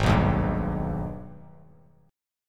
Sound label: Ab Minor Major 7th, Bass Standard; strummed; JGuitar